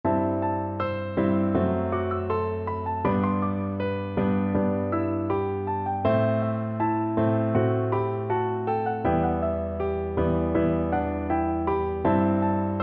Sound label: borodin polovtsian, piano duet